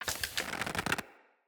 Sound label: Bow Charge; Weapons; Player; Bows; DendritBow